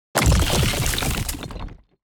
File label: building destruction build